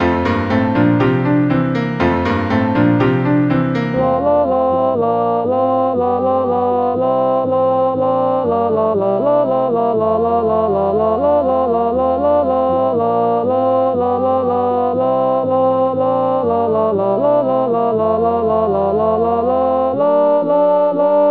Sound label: Alto; Ar hyd y nos